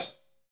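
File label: HH, hi-hat; 90 s Sample CDs; Sound & Vision - Gigapack I CD 1 (Roland); Real-Kit m15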